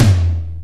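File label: DR550 Tom Mid, Boss DR-550 Drum Machines; 300 Drum Machines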